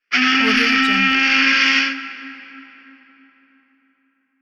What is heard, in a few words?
ship steam whistle